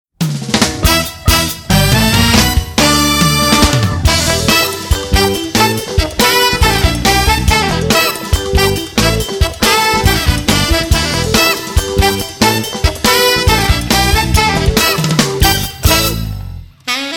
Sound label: Doctor sax